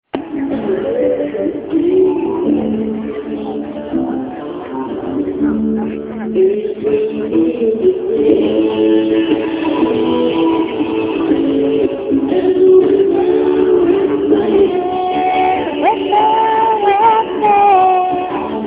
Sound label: bluegrass